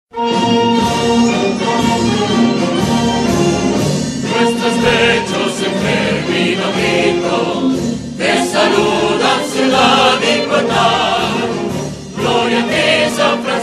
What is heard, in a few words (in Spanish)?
Himno de Quito; ASAMBLEA YARUUI 2018